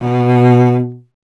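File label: strings cello B; Miroslav Vitous; Solo Instruments VOL-1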